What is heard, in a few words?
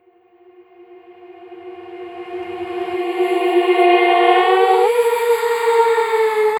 Riser Vocal A